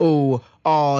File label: "ooh ahh" male